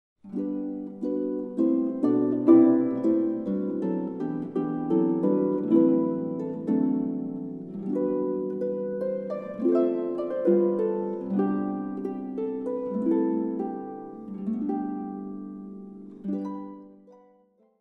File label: Joyful joyful we adore Thee, harp solo